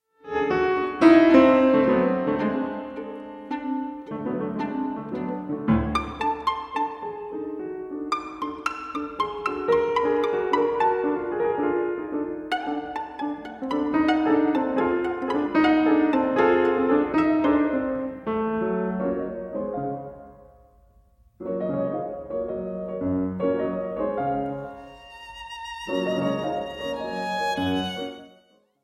sonata for violin and piano in G major, 2nd movement, blues; maurice ravel; 1927